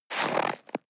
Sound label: step snow walk single hard; natur-tiere; schnee